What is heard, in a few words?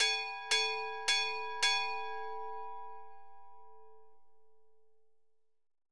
Building Fired Up; virtual city windows gamerip 2009